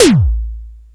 big room tom a key; tom drum

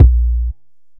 tr 808 analog drum kick a sharp key

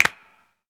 clap single shot a sharp key

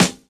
trebly snare drum sound c key

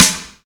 nice snare b key; snare drum samples

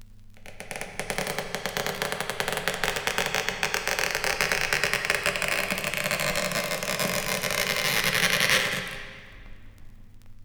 coffin lid opening; horror

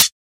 clean pedal hi hat c sharp key; one shots; hat samples

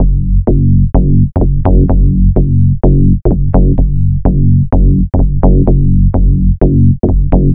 Techno Rich Mid Bass; instruments; loops

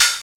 soft hi hat f key; drum single hits one shots; hat samples

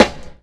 rich low end steel snare drum sound D key

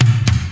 high tom sound b key

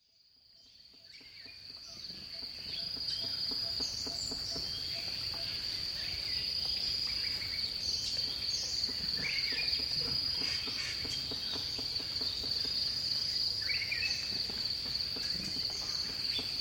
bird song in dipterocarp forest

